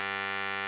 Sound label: RMI EP; Sonic Reality - Vintage Timetraveler